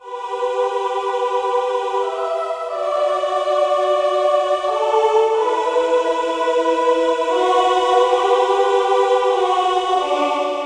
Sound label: choir, Light Sounds; KILLERSOUND; Remixable SoundSets